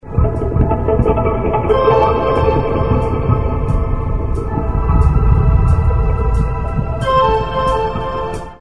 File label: Ringtone